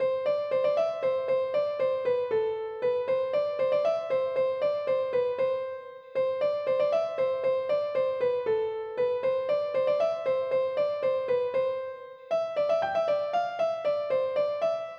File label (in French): bourree; boeuf trad